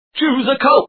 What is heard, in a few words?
choose color; super racer